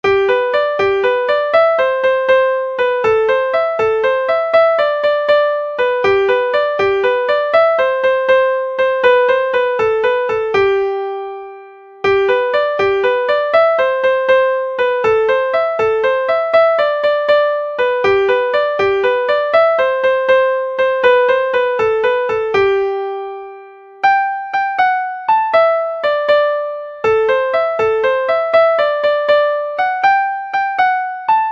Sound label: cajun jig